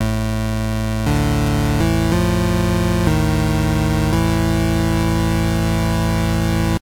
game over

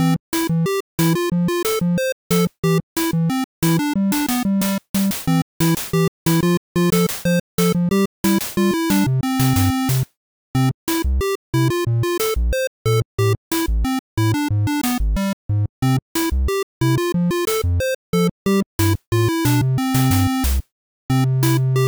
random silly chip song

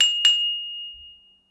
ding double

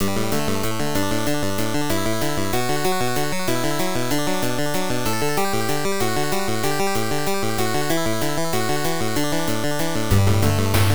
8-bit space

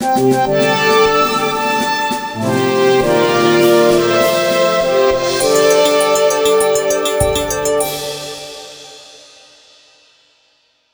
Fanfare

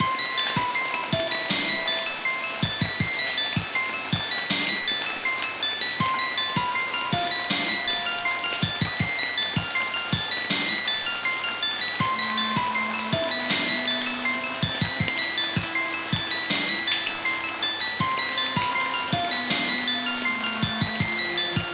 menu loop rain; zombie soundtrack; unreleased

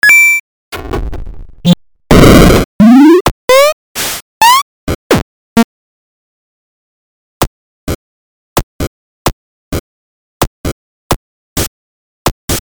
8-Bit Sound Effect Pack

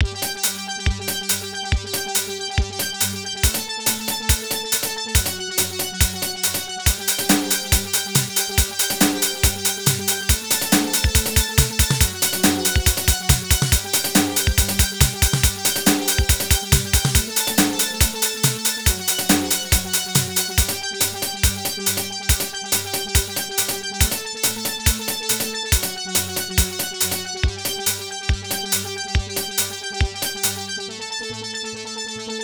action loop

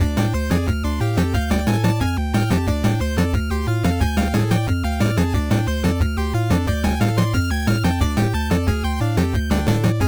rock city ransom chiptune version; Fakebit / Chiptune Music Pack

